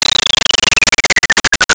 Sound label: Arcade Chirp Descend; Arcade and Games